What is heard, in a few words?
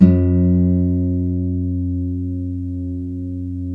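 GUILD 12-string, Guitars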